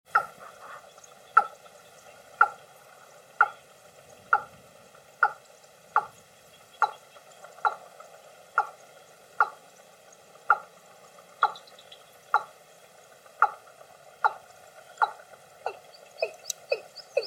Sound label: Watercock Sound